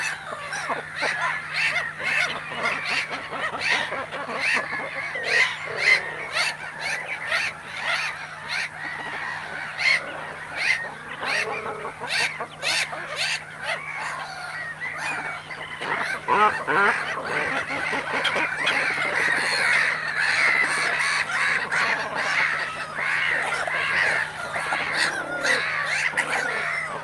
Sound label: animals cormorants nest loud seagulls; mono; dpa4061; sonniss gdc